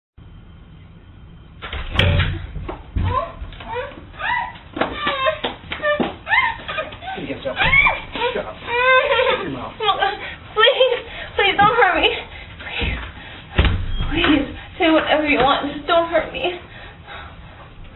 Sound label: ooo